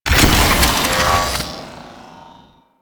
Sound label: Console Hack End; Warframe; Protea Quest